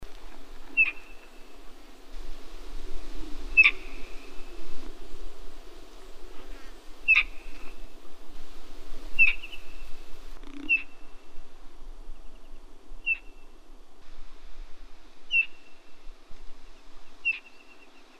Black shouldered Kite

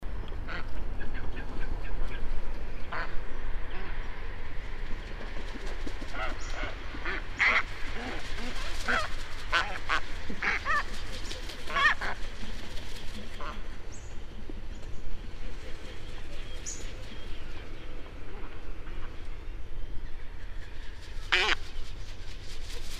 Australian White Ibis

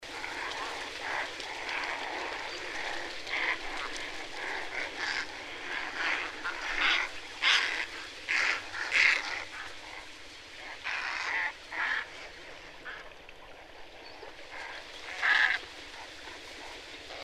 Straw necked Ibis

